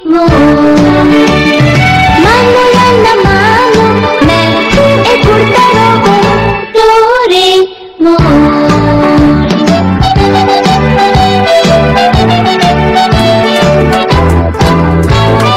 cartoon song ringtone

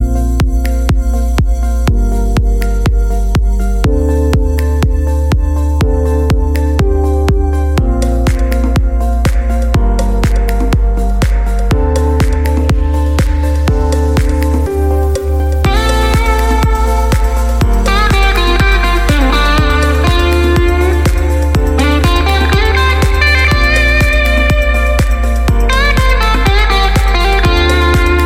huawei p30 pro ringtone

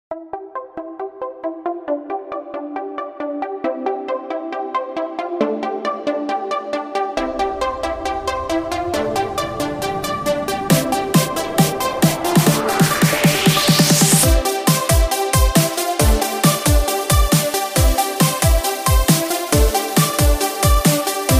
trance ringtone; mi

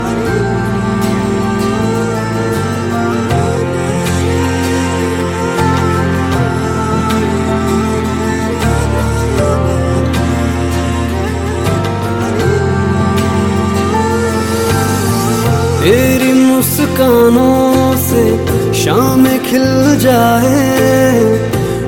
hindi music ringtone, bollywood

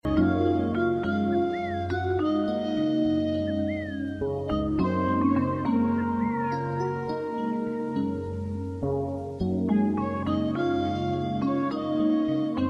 peaceful music call tone ringtone

